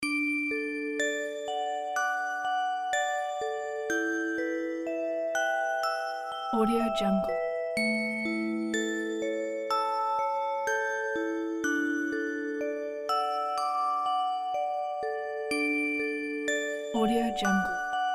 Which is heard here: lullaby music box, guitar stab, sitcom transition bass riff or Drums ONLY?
lullaby music box